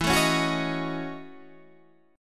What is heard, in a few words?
Gb Minor 13th E